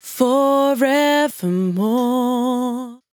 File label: 100bpm Bm, Velvet Vocal; Zero-G